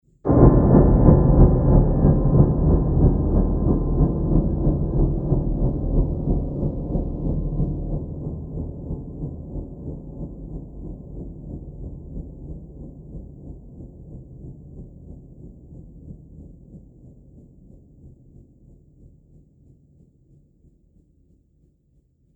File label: Horror Piano Tremble, Piano Sound Effects